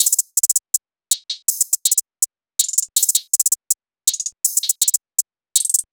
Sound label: Breakdown HAT LOOP 81BPM